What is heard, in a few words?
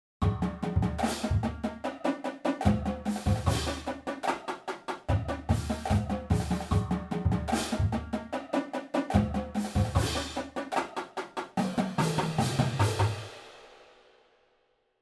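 groove, drum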